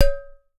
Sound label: UTAR, Definitive Percussion